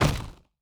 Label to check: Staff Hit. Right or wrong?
Right